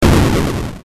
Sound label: explosion Mini; ninja gamma